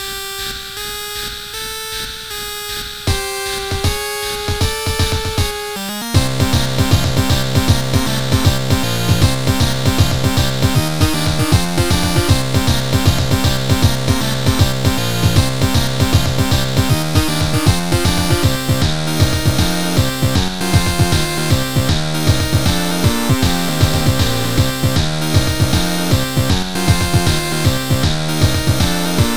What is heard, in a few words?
Internal Percussion